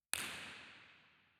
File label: Auditorium, Theatres